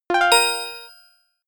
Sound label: feedback positive, signale